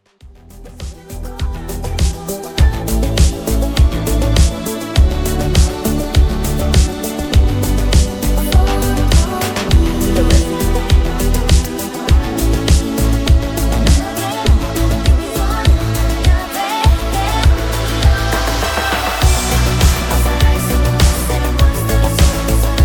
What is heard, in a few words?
Groove